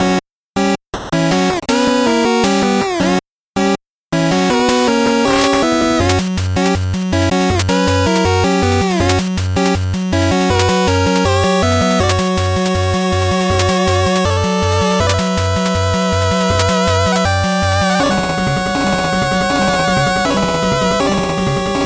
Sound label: 8bit bgm slow